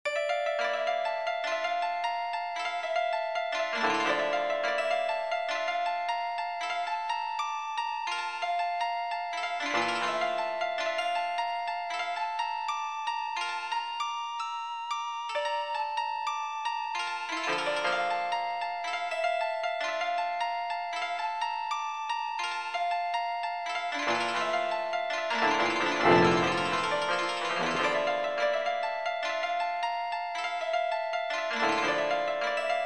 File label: shakuhachi and koto